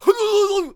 Hwacha Death Vocals

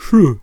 Bard Attack Vocals

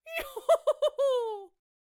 Hay Baler Attack Vocals

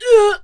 Harvester Death Vocals

